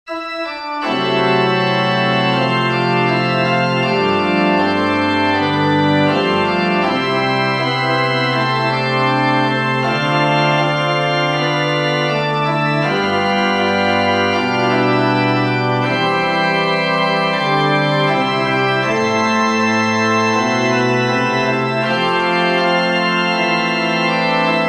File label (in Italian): organo